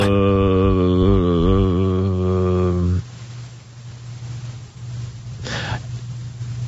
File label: LONG Ughhh; WFAN